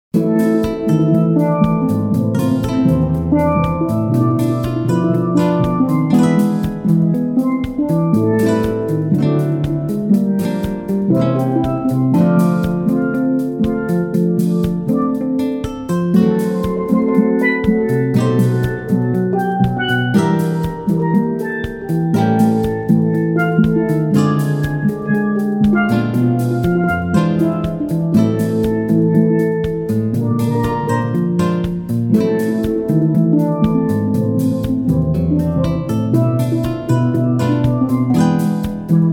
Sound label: steel drum band